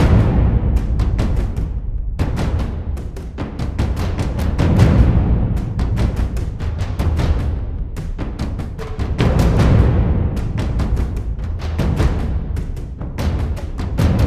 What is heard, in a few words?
Drums Ambience; Assassin's Creed III